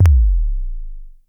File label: low bass long, Drums, Roland SH-09